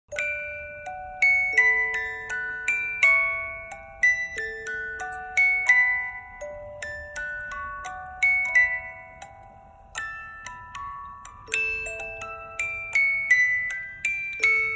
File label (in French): boite a musique